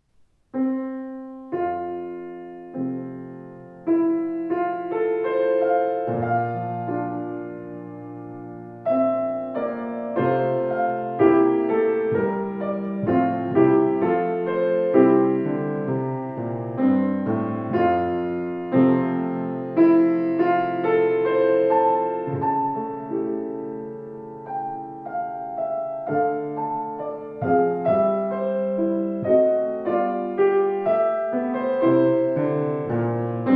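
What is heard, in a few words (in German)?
Yamaha C3 Traum, Flügel; 186cm-c3-fluegel-2003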